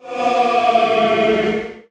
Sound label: attack; svencoop; morass beta; de man